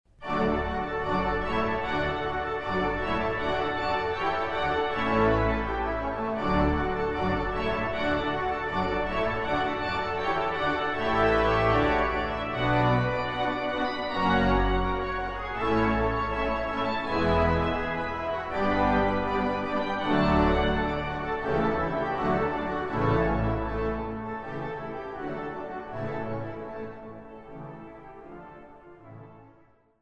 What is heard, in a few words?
Fanfare